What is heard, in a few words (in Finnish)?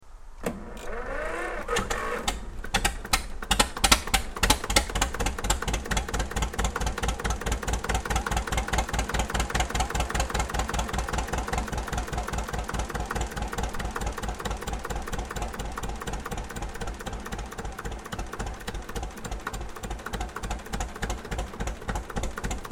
Zetor tasainen